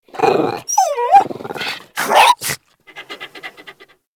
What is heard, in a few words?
Puppy Claim Wakeup; Vocals; Warframe; Kubrow